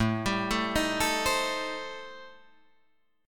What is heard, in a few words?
A-Minor 7th Flat 5th, Guitar Drop D, chord